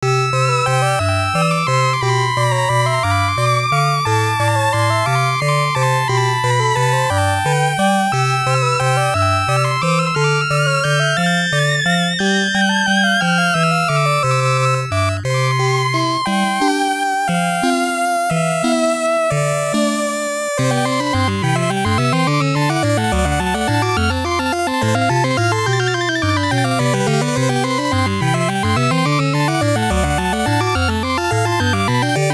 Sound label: Waltz; Alchemist Marie & Elie Futari no Atelier; WonderSwan Color